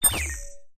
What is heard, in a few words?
science fiction door code unlock